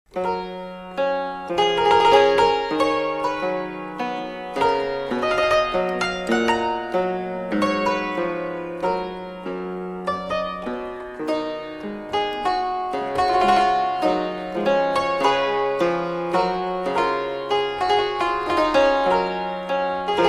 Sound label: piano variations from sonata in g major; jc bach